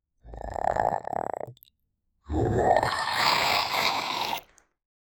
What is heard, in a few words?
Forest Creature